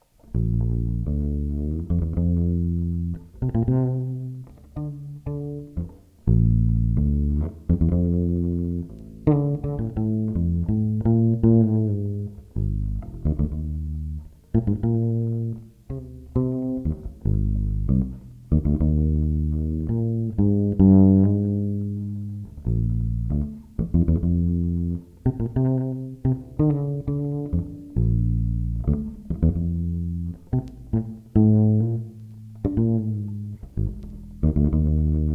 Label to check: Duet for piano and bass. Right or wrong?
Right